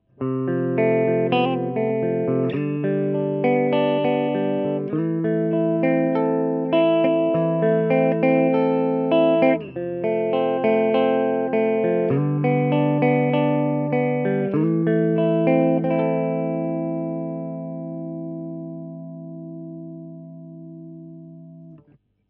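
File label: e906 clean high rolloff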